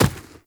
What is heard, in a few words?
Infested Medium Run Dirt, Footsteps